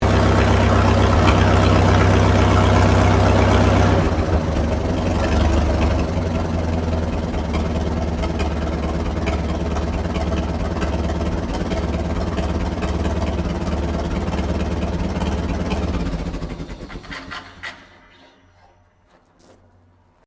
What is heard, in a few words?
Tractor McCormick Deering W6 1942 shutdown, Old Farm Tractor; Tractors; Vehicles